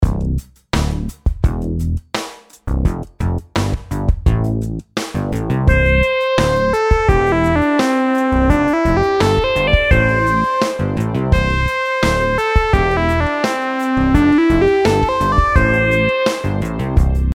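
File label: melodies simple 8s triplets C major; melody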